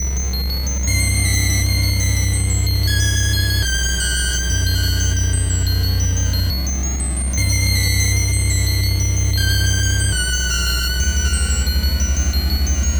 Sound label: Background Nightmare; dwellers empty path